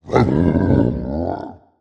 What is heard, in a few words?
Canine Growling, Monster Sounds; TheDeclineOfWarriors; Monster Sounds Volume II